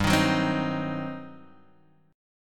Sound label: G Major 7th Suspended 4th Sharp 5th, Guitar, Standard; strummed